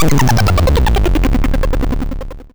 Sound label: falling